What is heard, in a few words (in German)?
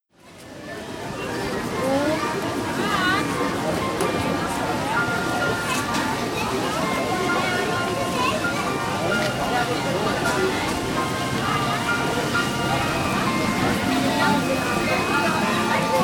Winter Atmo